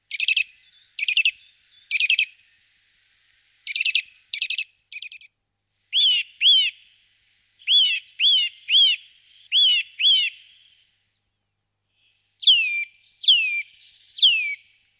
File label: sitta europaea, bird